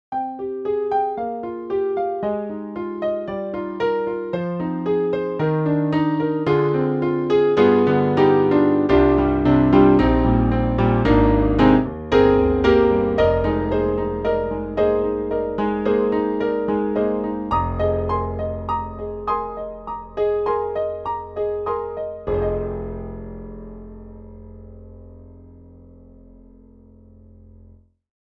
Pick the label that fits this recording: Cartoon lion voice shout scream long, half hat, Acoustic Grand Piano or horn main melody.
Acoustic Grand Piano